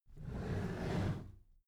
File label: packed drawer smooth clatter open soft halfway